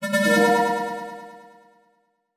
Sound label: Transition Musical Tone Medium; Production Element